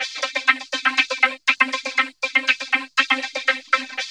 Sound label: VOC LOOP, VOCODER LOOP; Zero-G Ambient 1